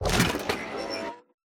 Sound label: Grabbing Player; boxy boo v2